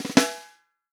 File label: REAL DRUMS FUNK KIT